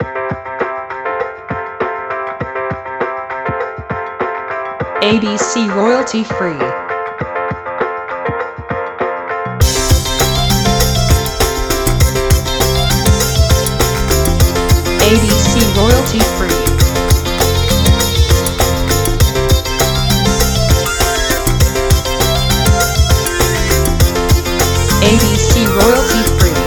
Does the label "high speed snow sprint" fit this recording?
No